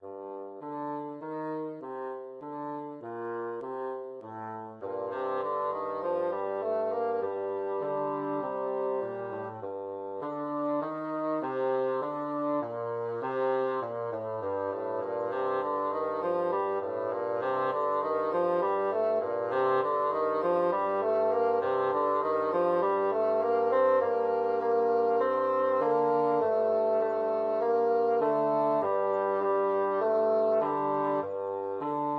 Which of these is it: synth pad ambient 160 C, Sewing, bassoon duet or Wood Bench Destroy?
bassoon duet